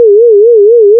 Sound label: fm beat